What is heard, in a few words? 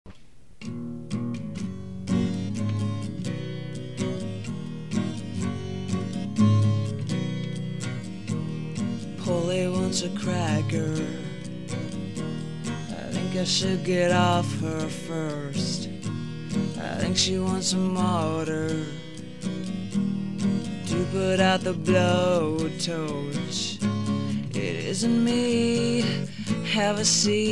vocals; moms favorite vase